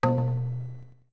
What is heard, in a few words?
Notes Drums B long